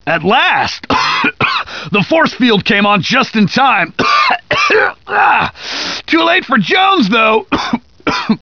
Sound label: movement; gearbox; gunman